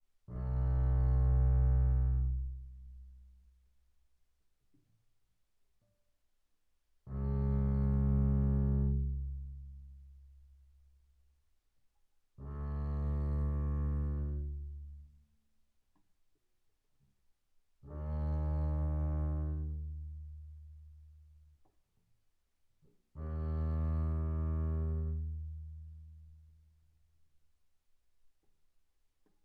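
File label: Bass arco sul A mezzo forte C2 A2, double bass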